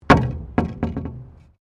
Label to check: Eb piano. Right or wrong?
Wrong